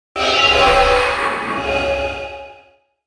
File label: Swirling Whooshes Ascend Swell; Swells; Blastwave